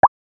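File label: high energy cartoon, animations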